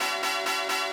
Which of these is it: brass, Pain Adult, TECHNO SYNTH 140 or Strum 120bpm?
brass